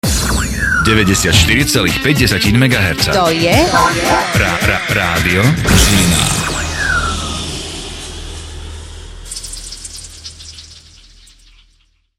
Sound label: jingle